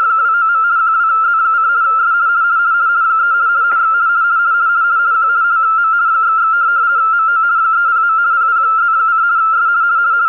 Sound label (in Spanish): sonido ejemplo rtty vlf